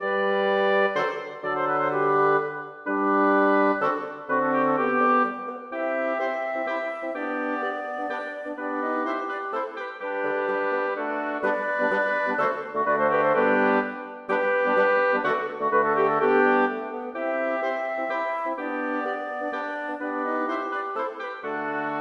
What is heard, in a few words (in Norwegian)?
Menuetto for blåsekvintett